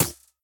Stick Click; smite; raijin

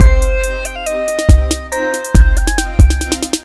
victory music; Smite; god Kuzenbo v2

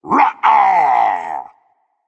yay berserk; cuchulainn

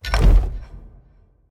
armor; smite; chaos titan

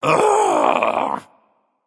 ugh, voicepack; smite; jorm

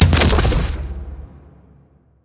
activate; smite; god raijin

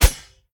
swish; smite; gilgamesh

